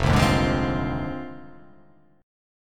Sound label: F Minor 13th; Fm13; strummed; 1,3,1,1,2,3,2,2; JGuitar